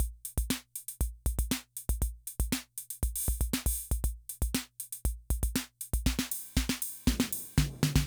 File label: Loop, rhythm 55, classic machines